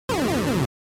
NES RPG Defeat

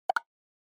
Percussive Accent High

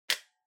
Portable MD Open

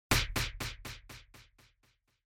Motion Grab Delay; モーション 掴む; ディレイ

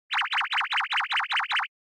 Anime Motion Long

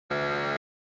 Quiz Buzzer Mid

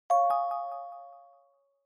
Smartphone UI Sound Dry

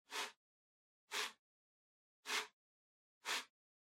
PC Mouse Move Far Hard Pad Short

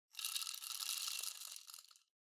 Spice Mill Pepper Refill

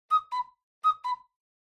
Cuckoo Clock Mid

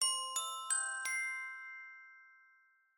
Chime Announce

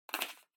Drawing Box Ball Noise

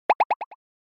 Accent

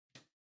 Light Switch Rocker (Far Dry Gentle)

